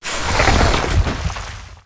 flower

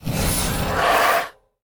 evil WindWolf main

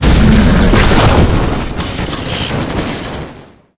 mech ape lord dead, monster

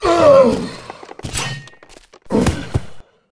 gaxe death, monster

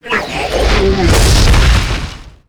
scholar ghost atk; monster

